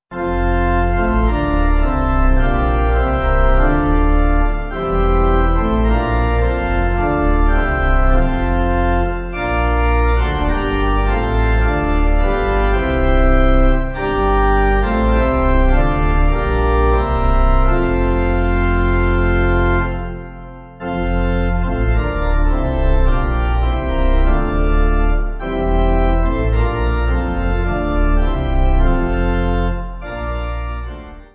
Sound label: Saviour To The Father, Pipe